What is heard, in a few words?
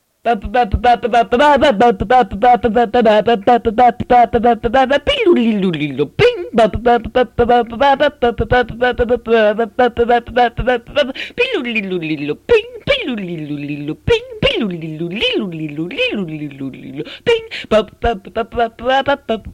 music music music